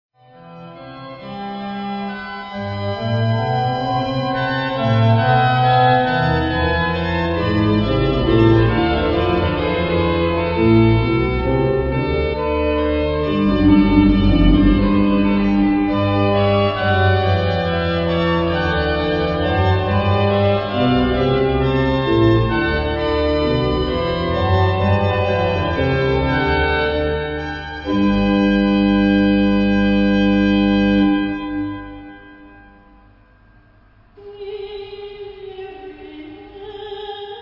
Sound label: Kyrie Trio, orgel; Nistelrode; Couperin